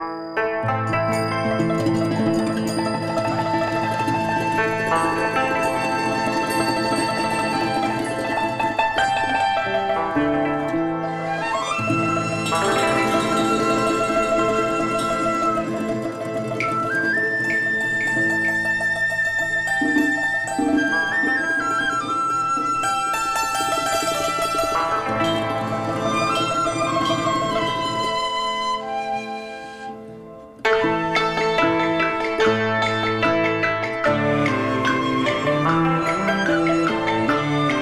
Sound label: TRADITIONAL Chinese, Picking Flowers